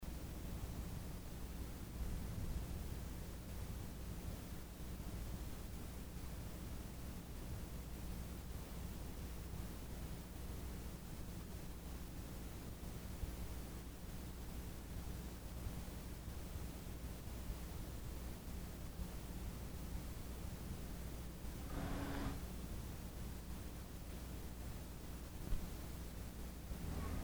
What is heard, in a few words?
birds and waterfalls, montana